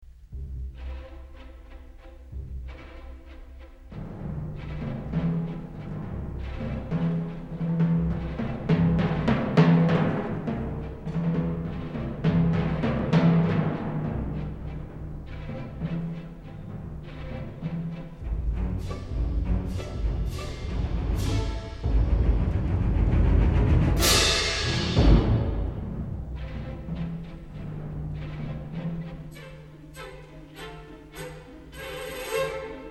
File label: percussion moderato, orchestra